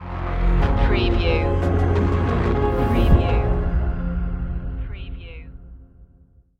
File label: mournful regrets sting